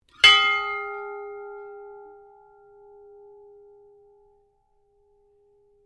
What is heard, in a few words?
SHIPS BELL RING; Ships Bell Alster Star; Various ships bells; Boats; Vehicles